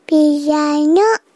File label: piano